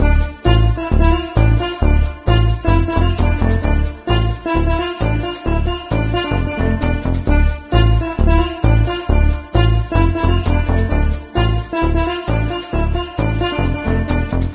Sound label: Spaceship Music; Diner Dash: Flo on the Go; NDS